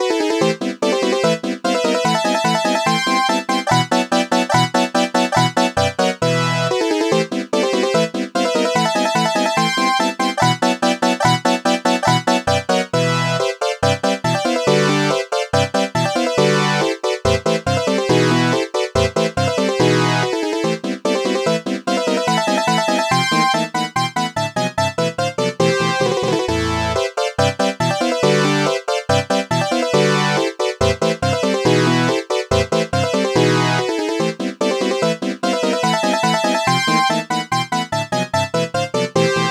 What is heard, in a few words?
fifth sawtooth wave